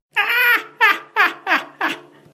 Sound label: evil laugh